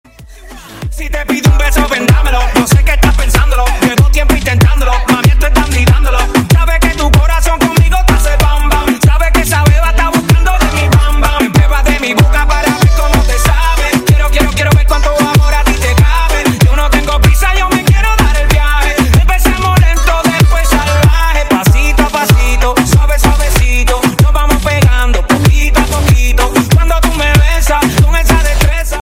rap ringtone

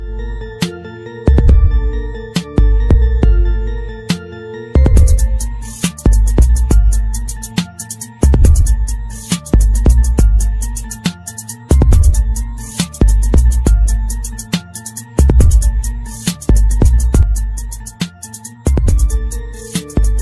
bgm english ringtone

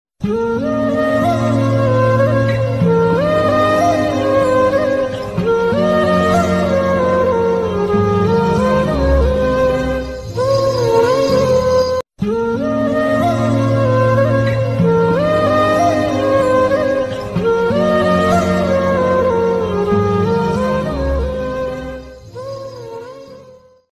sad ringtone, bollywood

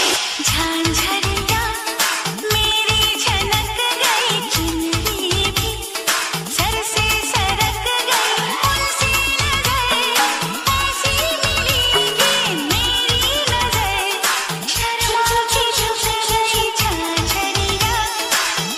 romantic music ringtone, bollywood

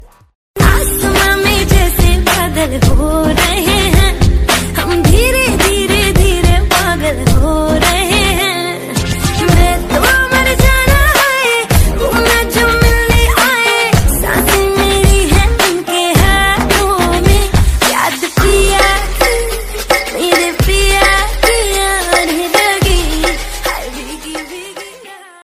love english ringtone; english ringtones

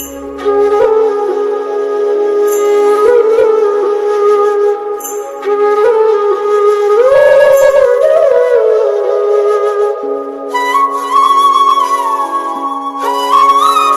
love feeling bgm ringtone; english ringtones

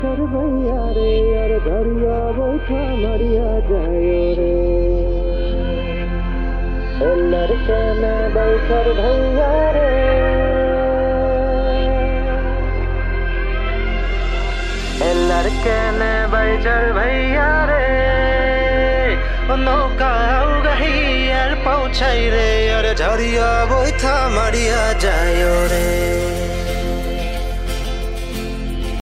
hindi ringtone, bollywood

